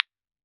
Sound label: telephone 90's; Speakers and telephones; Telephone 90 model